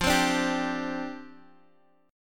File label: G 7th Suspended 4th F